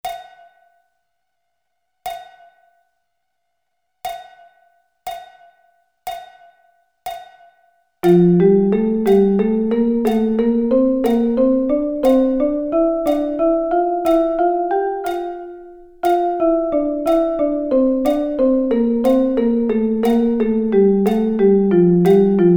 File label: F Double Sticking